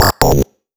Machine Blip; 8-Bit Kit Volume1

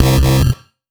Engine Bass, 8-Bit; 8-Bit Kit Volume1

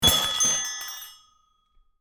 store entrance bell; spookonomics

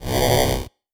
Noise, 8-Bit; 8-Bit Kit Volume1

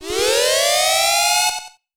Red Alert FX, 8-Bit; 8-Bit Kit Volume1

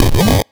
Bouncer, 8-Bit; 8-Bit Kit Volume1

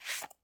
draw Knife; spookonomics

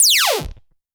Zap FX, 8-Bit; 8-Bit Kit Volume1

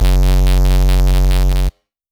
Phat Retro Bass, 8-Bit; 8-Bit Kit Volume1

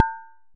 impact Glass medium; spookonomics

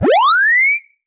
Jump, 8-Bit; 8-Bit Kit Volume1

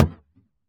impact Plank medium; shipshot

